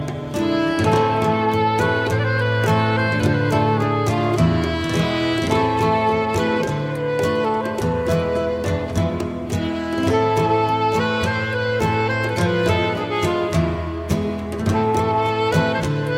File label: Playing Solo